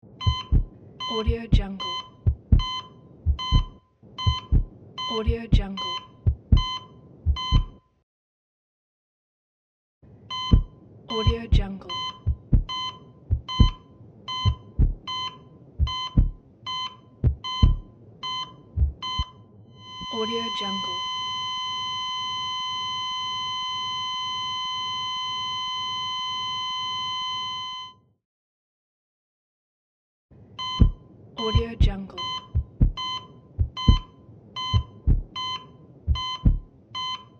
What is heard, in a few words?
electrocardiograph and heart rate